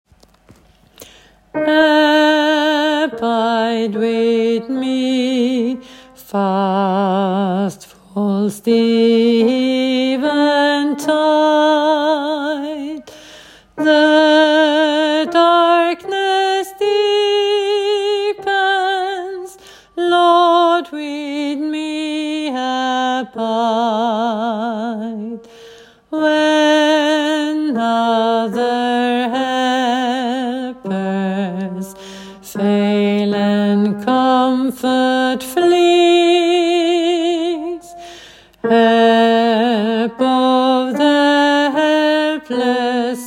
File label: abide with me bass; åfjord